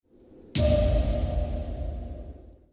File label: parry sound effect; dark souls ii